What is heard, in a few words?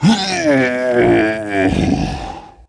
half ogre male die; DAoC; Toa; Gamestar